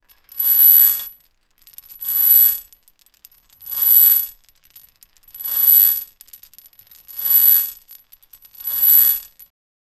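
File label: iron chain movement; iron chain mouvement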